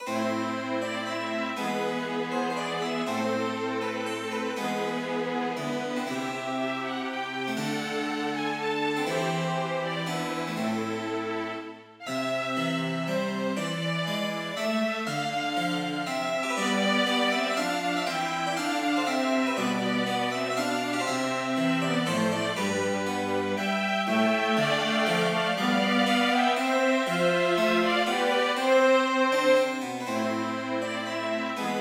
Rondeau for strykekvartett og cembalo, med cembalo; Hertz

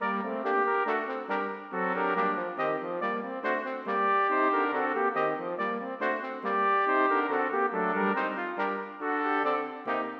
Menue for to trompeter og to tromboner; Bang

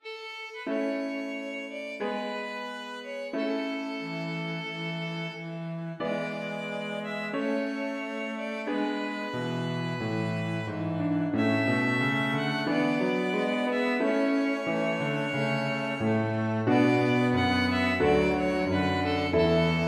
Melodi for fiolin, cello og piano; Walcke; Her er en melodi fra en av hans dansebøker.

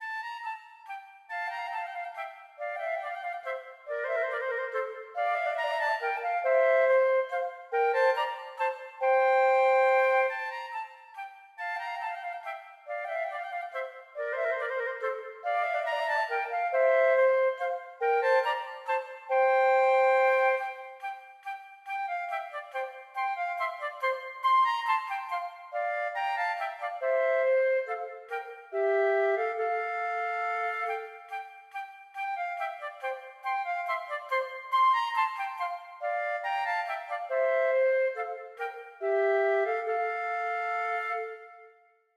Menuet for fløyteduett; Aamodt

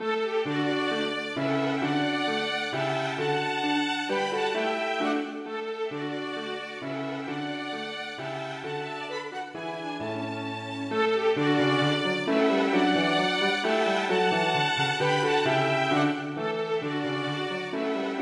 Menuet for to fioliner og piano

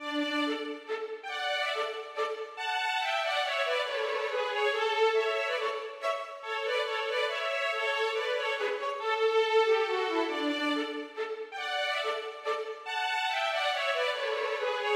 Menuet for fiolin duett; Aamodt

